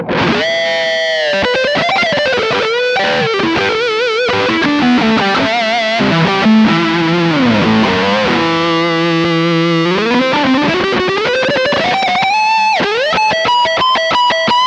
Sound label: Ibanez RG631ALF Highgain Lead